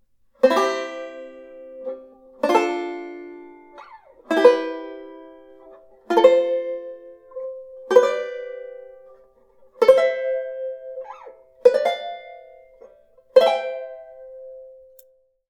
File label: Root Triad Scale; Beyond Chord Melody Volume II